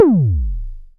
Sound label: MS20 TOM; INIS - Funky Xtreams; MS-20 KIT